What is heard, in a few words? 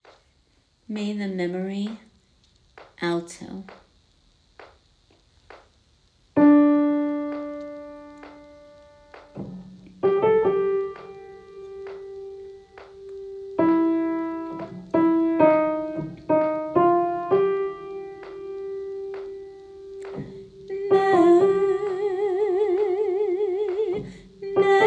Alto; Veteran Day Shabbat